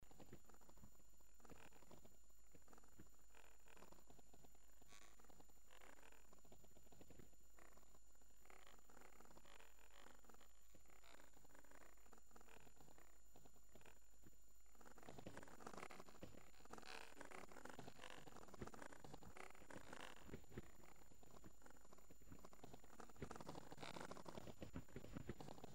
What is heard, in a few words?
purr purr purring